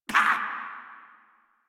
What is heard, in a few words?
Pain, Dialog, Barks, Battle; en; Warframe; Grineer